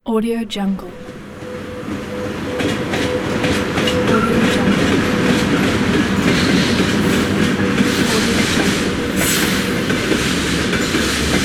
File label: long big train